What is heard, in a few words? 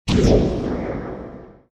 water sho